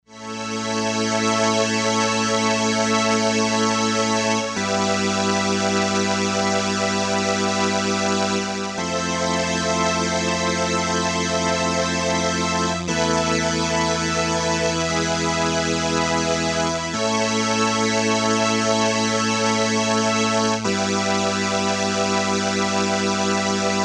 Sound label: String Ensemble section, Crumar Trilogy